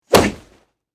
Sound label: Bullet Hits Body Dry I